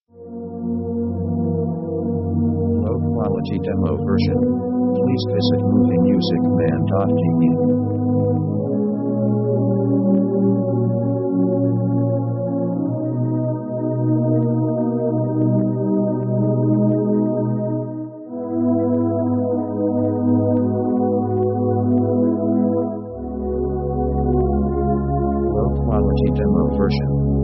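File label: orchestral modulation upwards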